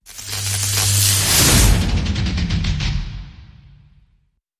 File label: Hit Voltaic